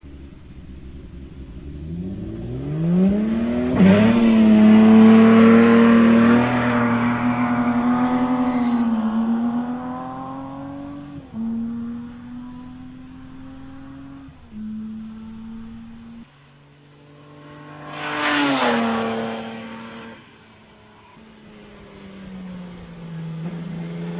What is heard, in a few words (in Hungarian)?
Jaguar D-Type, motorhangok; autosport